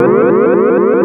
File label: ALERT, SF EFFECTS; Q Up Arts; Dream Experience